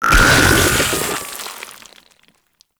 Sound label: Infested Drop Pod Spawn Enemy; Warframe